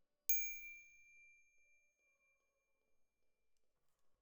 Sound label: Glock Close, Jazz for piano